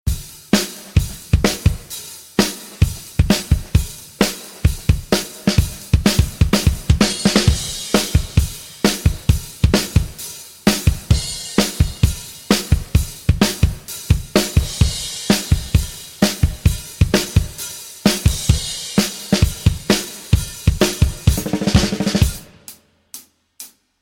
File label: Drums Room Verb